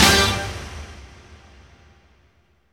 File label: HIT, Orch.Hits; Roland LCDP08 Symphony Orchestra